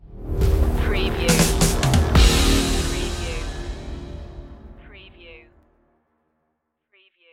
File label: check point stinger